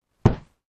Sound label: throws; ぶつかる3; …ホントは殴った時の音にしたかったんですが； 何か落とした音にも？